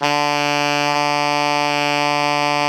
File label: ALTO D 2, Sax; 4-WAY